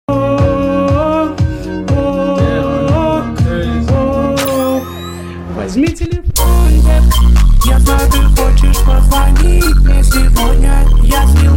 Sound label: hoodtrap remix